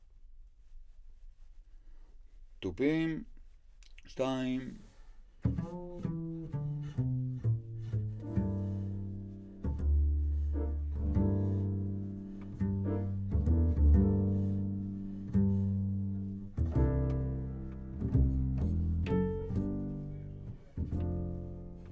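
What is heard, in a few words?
Contrabass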